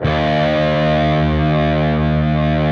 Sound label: PRS E, PRS LEAD, Guitars; Spectrasonics; Hans Zimmer Guitars VOL-1